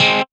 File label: Synths Root C